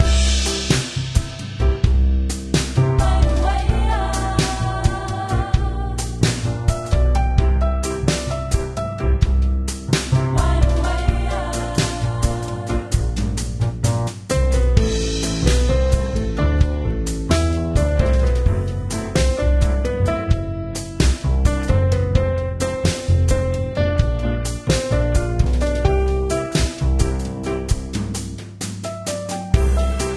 background; doomsday clicker android ios gamerip 2016; bayu wa haaa

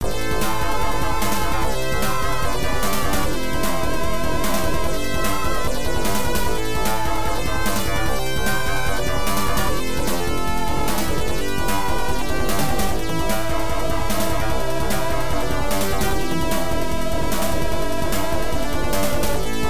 Deli Shop Quartet; Tyrian

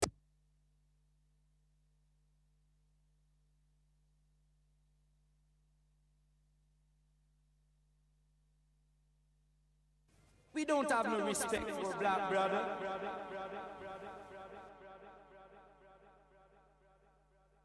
Vocals; PixelJunk Shooter; PS3

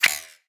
Dartgun Generic Impact

